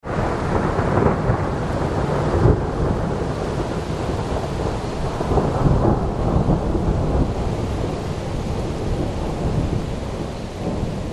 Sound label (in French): orage pluie tonnerre